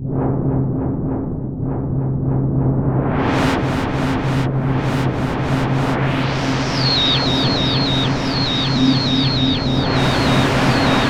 GALACTIC, ATMO; AKAI Anime Sound FX

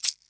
Ganz down, EMU Orbit-3 drum machine; 300 Drum Machines

